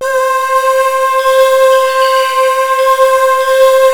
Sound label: old world, pads; Rubber Chicken Software Sample Bank; REAL INSTRMT